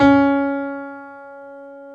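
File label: grand piano; B-GRAND PF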